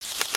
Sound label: Cards turn all; DSi Casino